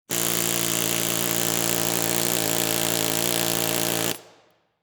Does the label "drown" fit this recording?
No